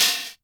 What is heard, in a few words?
hi hat open, Korg Electribe ER-1